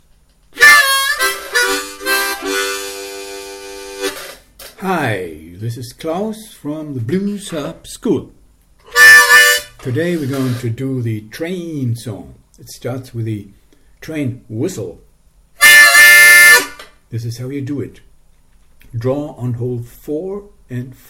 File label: harp train